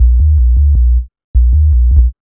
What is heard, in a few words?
B LOOP; E-Lab; X Static Goldmine 1